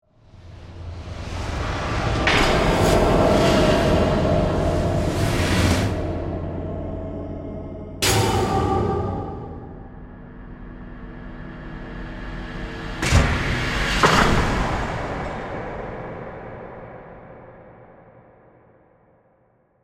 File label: Horror Composition, Ghostly, Swells, Haunting; Composition Sound Effects